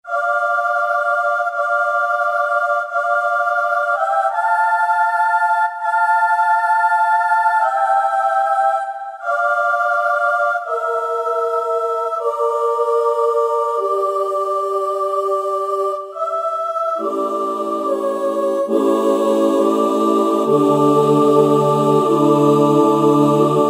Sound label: Mixed Choir, Kurzweil K2000; Kurzweil K2000 V3